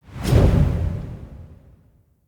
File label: Finisher Start Accent; Weapons; Envoy